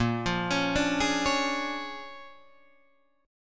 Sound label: A sharp 7th Sharp 9th, Guitar, Open D